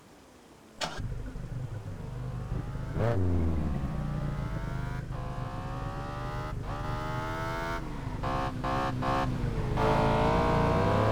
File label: maserati ghibli s; 2014; TDU; fast-furious